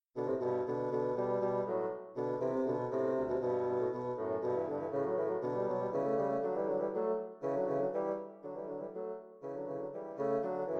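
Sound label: duet 2 bassoons; molter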